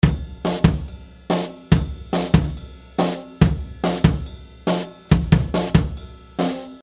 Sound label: basic with offbeat bd around 140bpm